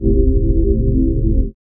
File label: chords